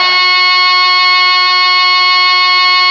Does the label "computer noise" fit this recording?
No